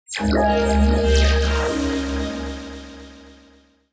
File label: melodic fx digital doodad Ab Maj; base pack